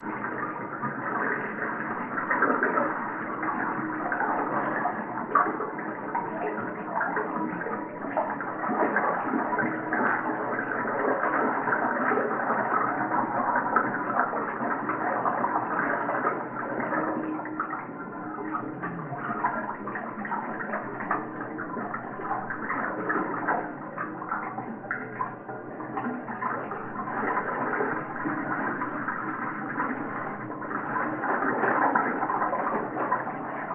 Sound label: carboy pour